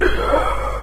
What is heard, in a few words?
Zombie; guns n zombies 2014 pc